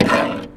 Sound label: Rock Steel Grind